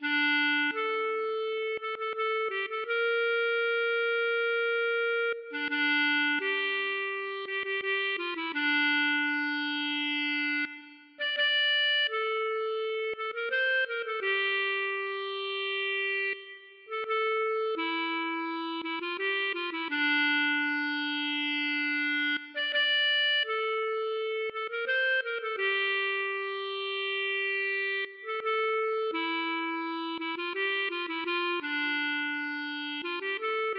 klezmer

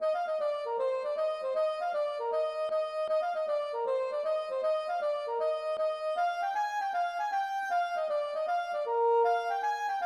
gavotte; Suite Huelgoat

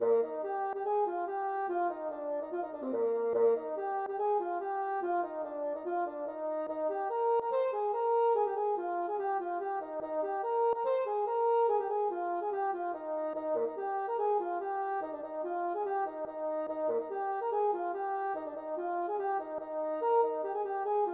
polka

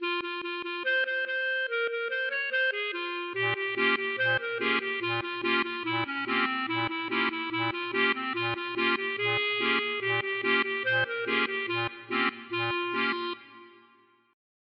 klezmer

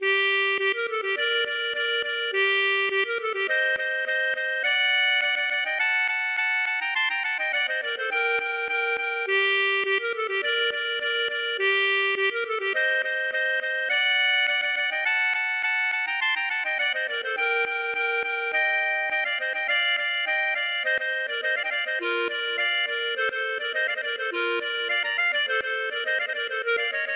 klezmer

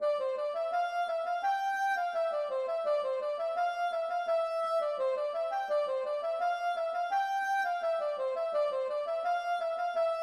rond salsa funk

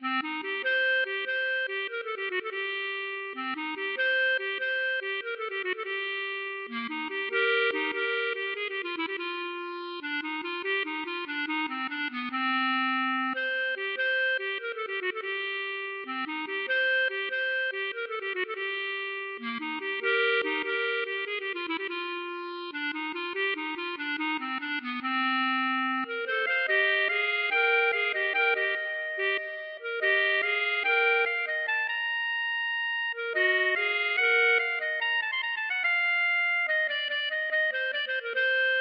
klezmer Bulgar

